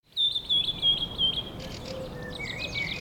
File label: sunny weather effect